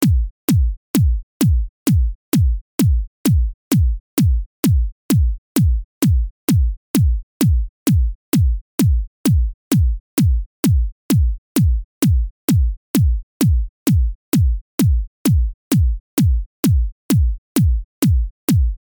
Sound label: Kick